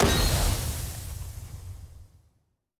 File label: Smoke Grenade